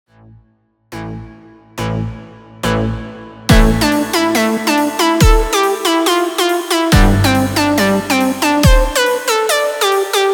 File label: Melody